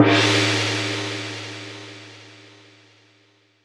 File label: Small Gong; Cymbals